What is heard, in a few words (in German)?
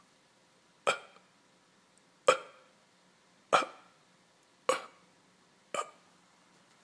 Stimmübungen Abknall